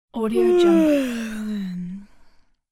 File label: female yawn